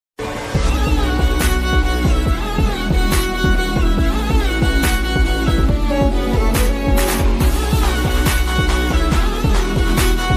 a1 express love bgm ringtone, south movie bgm ringtone; sandeep kishan